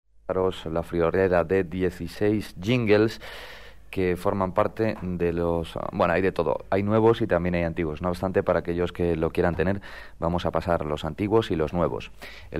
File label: Jingles; 40 Principales